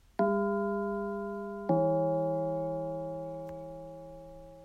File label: gong